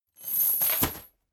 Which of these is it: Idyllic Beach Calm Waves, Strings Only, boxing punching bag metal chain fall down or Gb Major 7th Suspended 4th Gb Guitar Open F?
boxing punching bag metal chain fall down